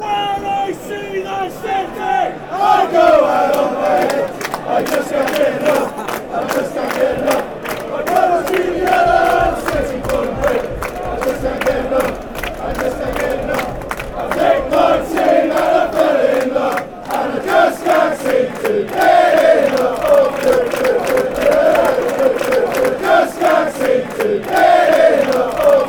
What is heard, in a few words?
chants